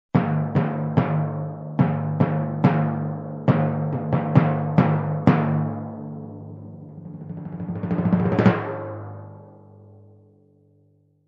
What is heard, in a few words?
Slow Timpani Groove with Crescendo End